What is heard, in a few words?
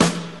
rich low end acoustic snare f key, snare sound tuned to the F note; 80s; snare-drum-samples